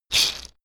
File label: shaker wood rough hit single soft